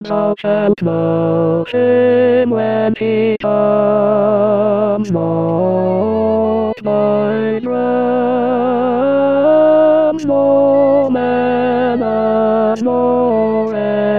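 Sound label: tenor 1; Sirett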